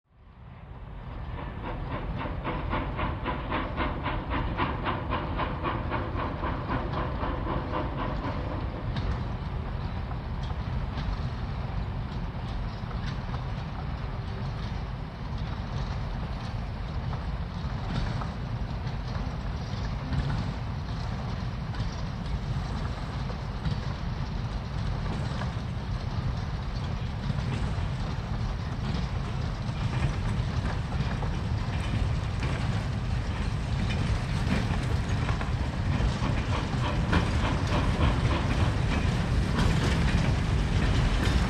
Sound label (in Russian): zvuk parovoza